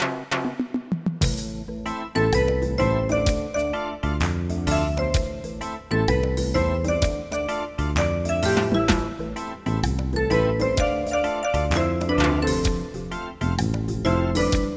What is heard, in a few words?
Reggae